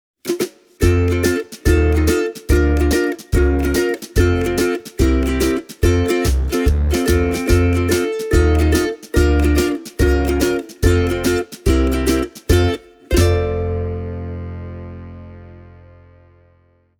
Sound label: kokio mahogany tenor, ukulele